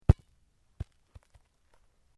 Sport Soccer Bounce To Rest Grass, Soccer ball sounds